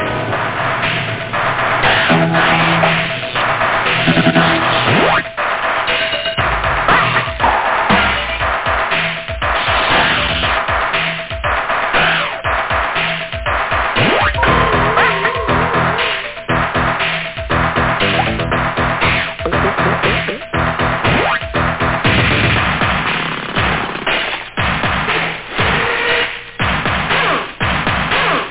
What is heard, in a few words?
hp rock